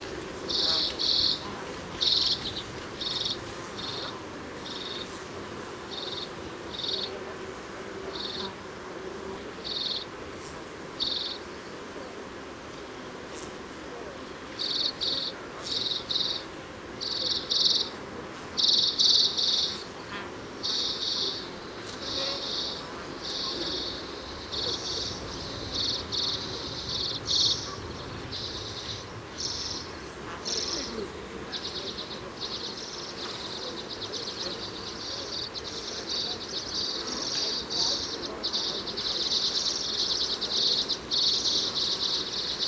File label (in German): Birkenzeisig; Alpspitze Grainau; Michle Peron